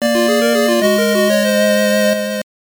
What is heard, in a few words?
save; Shining Force Gaiden Ensei Jashin no Kuni he; Game Gear